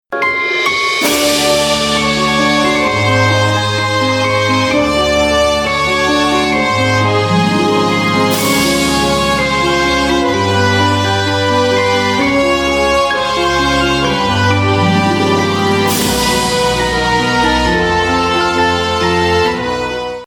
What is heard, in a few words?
wintery waltz